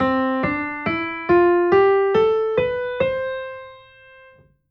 Major Scale

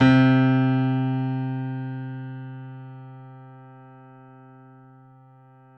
Piano C2

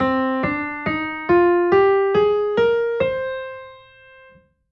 Minor Scale

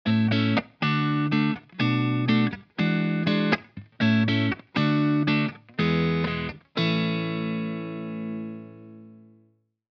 Resolving Progression

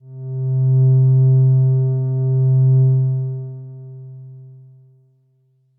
Soft Synth Pad Note C2

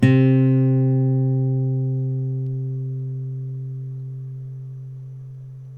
Acoustic Guitar C2

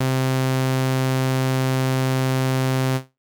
Saw Tooth Synth Note C2

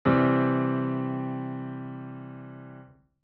Harmonic Chord

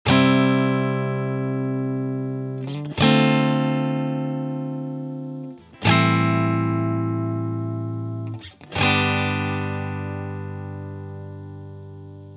Major Chords Fast and Slow

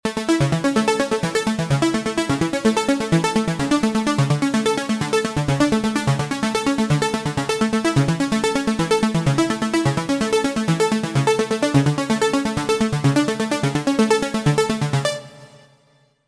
Hard Synth Plucks

